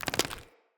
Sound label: Mockery Foley Melee Swing; Mockeries; Characters